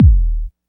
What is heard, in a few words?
tight steel kick drum sample D# key, Royality free kick drum sound